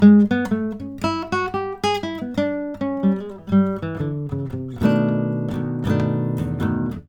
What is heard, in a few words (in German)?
Gitarre